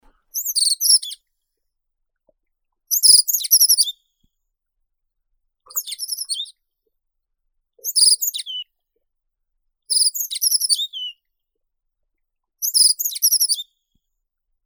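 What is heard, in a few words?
brown accentor, Prunella fulvescens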